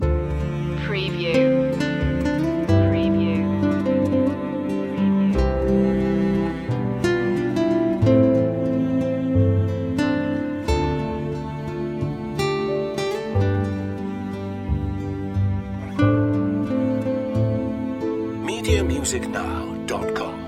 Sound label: sensitive calm loop